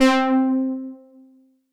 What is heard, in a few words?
SYNTH Ancient (C); Melodic One Shots; Cymatics; ANALOG EVOLUTION Beta